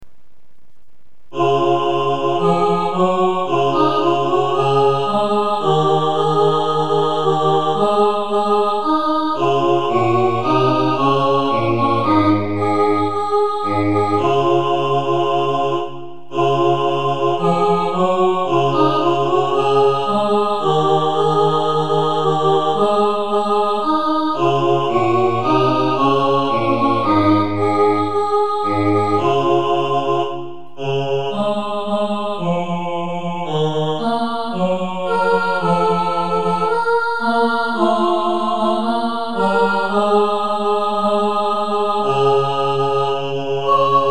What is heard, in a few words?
sop2 bass, choir